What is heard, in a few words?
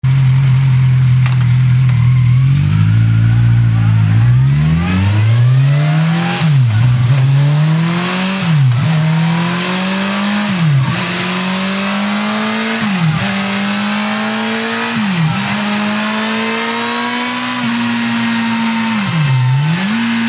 KAWASAKI Z1000 GP, exhausts; 2010